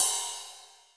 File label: Hats Real Open